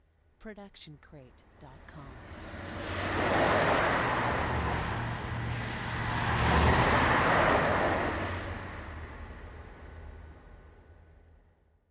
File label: cars passing by